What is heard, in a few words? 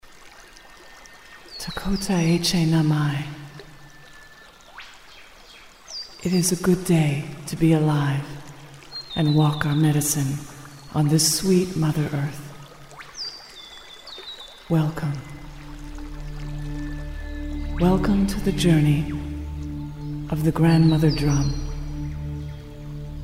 sample music